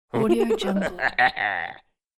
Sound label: psycho male laugh; human sounds